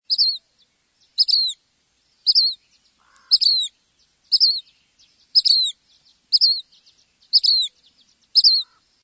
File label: Black Phoebe